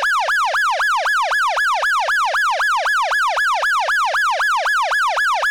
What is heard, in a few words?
Federal Signal 80k Wail, siren